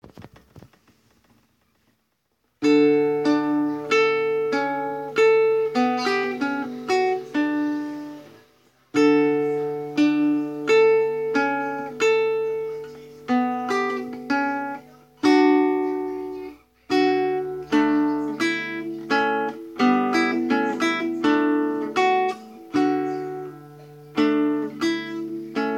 guitar

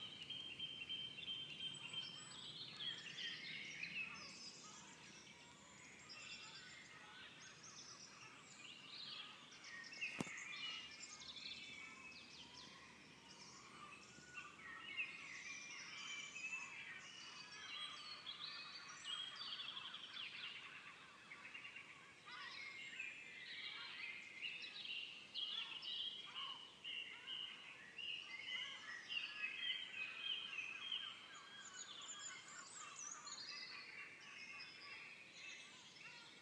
pre dawn chorus in crammond

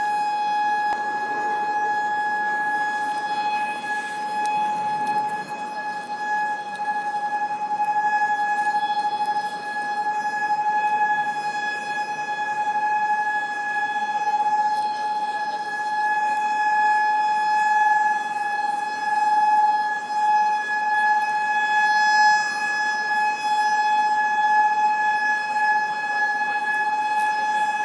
drawbridge siren; T Park; SF

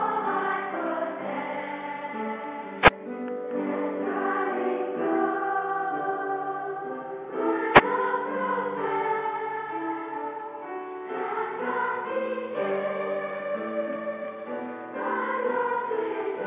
fifth grade song at graduation from lower school

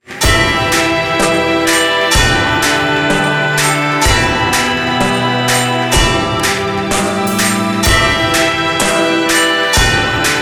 middle 7; bluefields

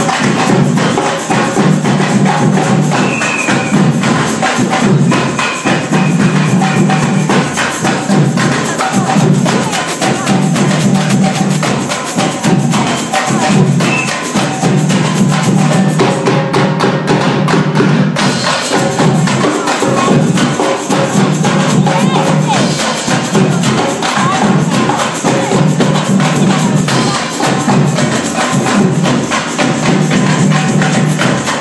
samba band; 20th birthday party

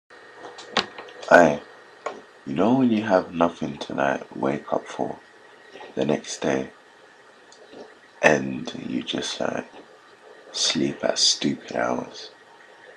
yeaaa

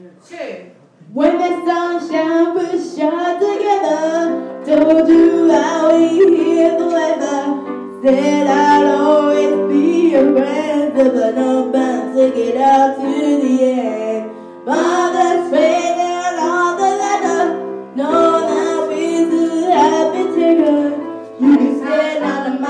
class singing rehearsals

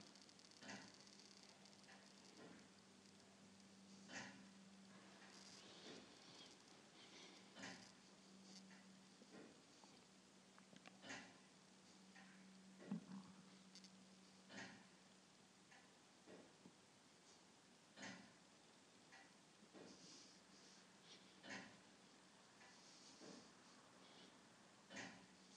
old and uncommonly noisy

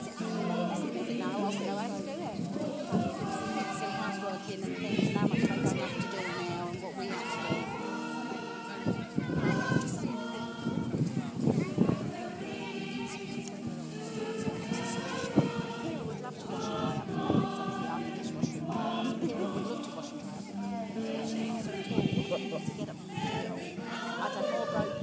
community choir in full voice

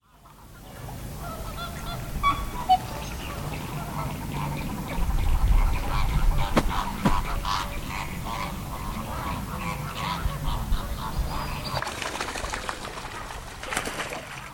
flap splash

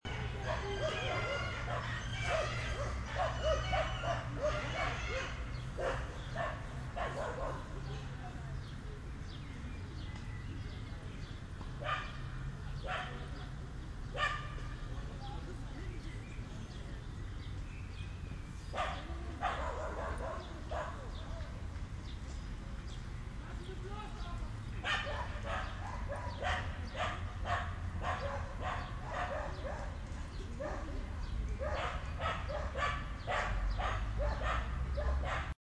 barking dogs in the park

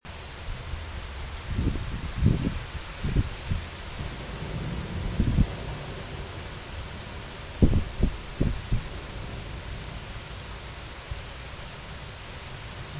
thunderstorm in berlin kreuzberg

